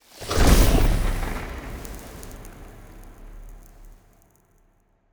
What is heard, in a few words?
ship corvette move; Stellaris; toxoids; ships